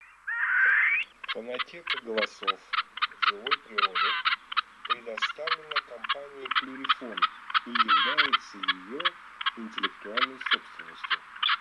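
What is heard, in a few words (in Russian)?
Куропатка сардинская Pernice Sarda, манок; plurifon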